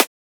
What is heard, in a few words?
Transient; FLPRO